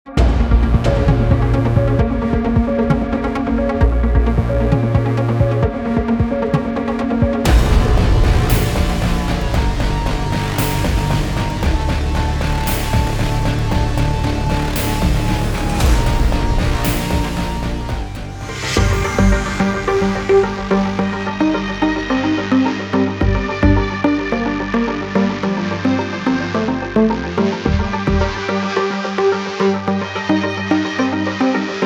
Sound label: Warped Tension